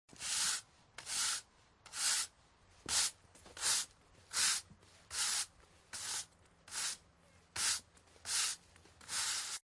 Odglos Zamiatania

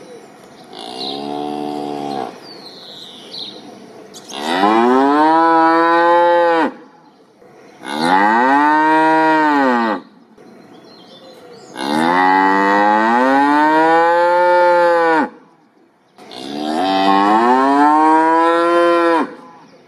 Krowa

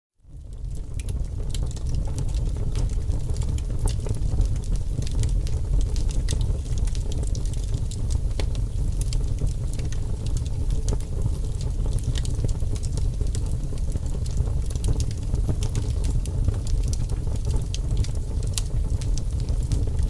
Odgłos Ogniska

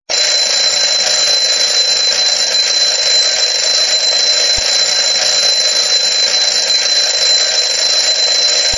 budzik; Grudniowe Opowieści

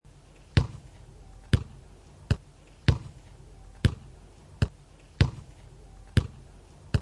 PILKA; Piłka; Grudniowe Opowieści

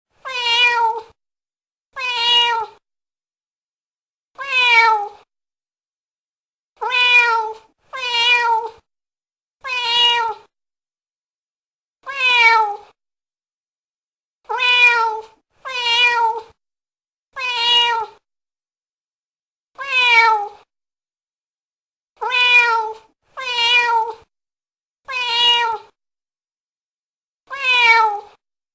Kotek Miau

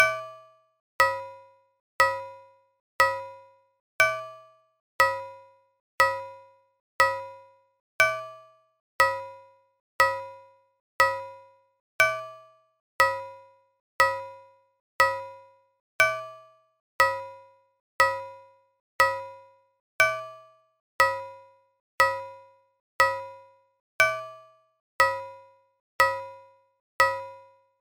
Karuzelka wolno; Chomik Heniek Wielka Wyprawa

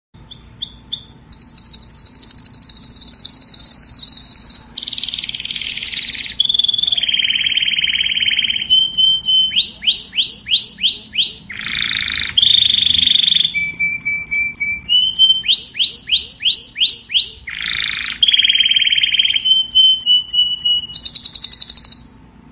Kanarek; Chomik Heniek Wielka Wyprawa

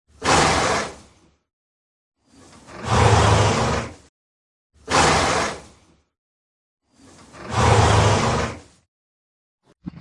Parskanie konia; na farmie

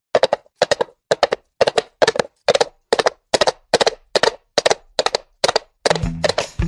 Koń na twardym podłożu; C01 04 Kon twarde; na farmie

